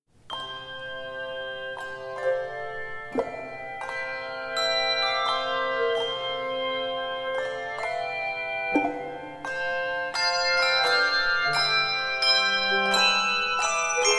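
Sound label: Handbells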